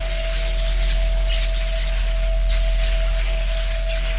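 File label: ambient sparkling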